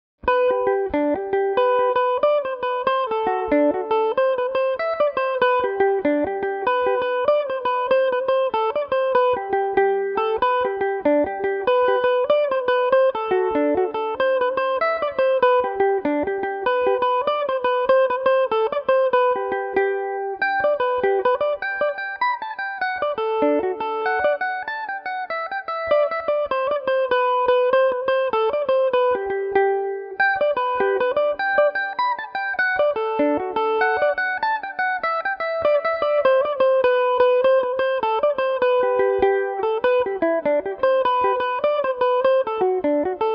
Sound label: irish washerwoman gmaj, mandolin